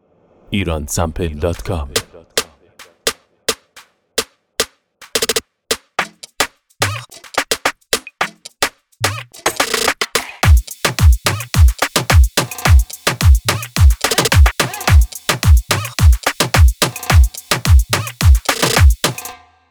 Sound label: Regetone; reggaeton collection